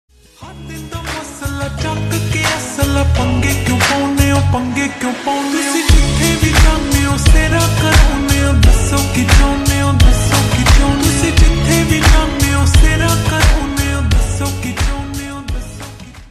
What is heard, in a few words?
Slowed Reverb Ringtone